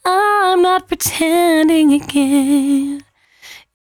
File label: 113bpm C#, Velvet Vocal; Zero-G